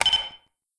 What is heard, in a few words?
satchel detonate